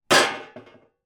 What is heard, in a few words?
industrial spade shovel metal down on surface